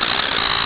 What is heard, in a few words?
WIN